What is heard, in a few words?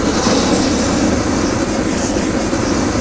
torch cut loop; gmantowers